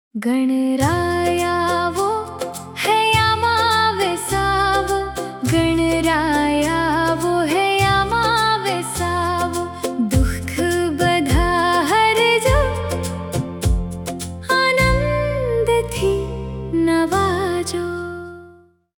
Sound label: gujarati ganaray aavo, ringtone; ganesh